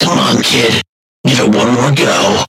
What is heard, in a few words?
Game Over